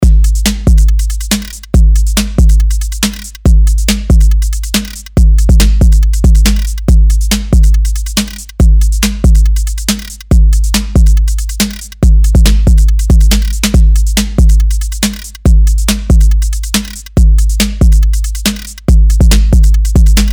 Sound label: drum loop freestyle 140 bpm